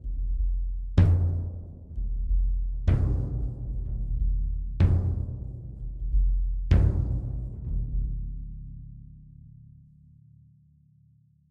Cinematic

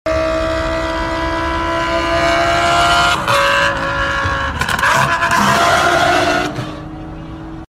Lamborghini Gallardo

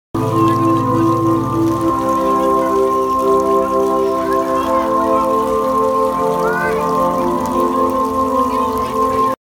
Hydraulophone

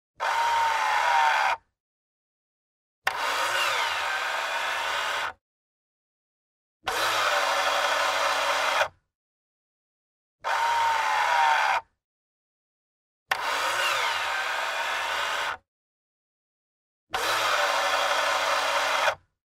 Electric car mirror; آینه برقی ماشین

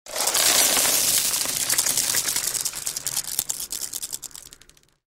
Marbles, قل خوردن تیله

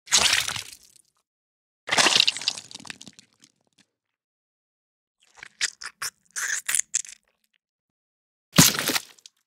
paint splatter; پاشیدن رنگ

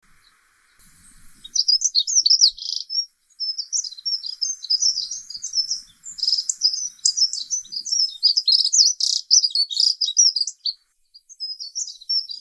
Accentors; صعوه

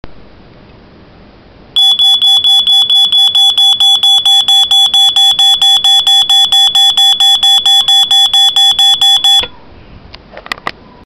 Fire alarm; آژیر اعلام حریق

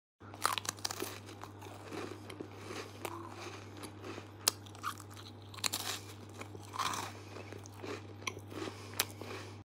eating biscuits

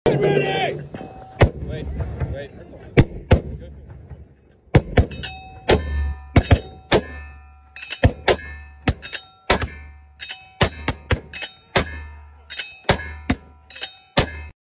Mortar 60; خمپاره ۶۰

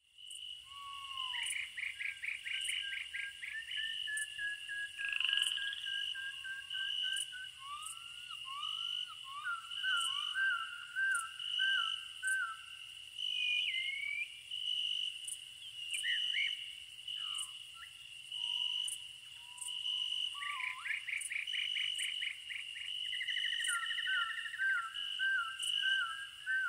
Forest and crickets at night; آرامبخش; جنگل و جیرجیرک

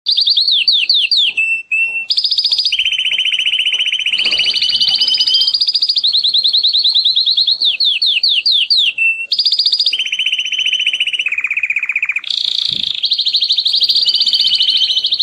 canary for training

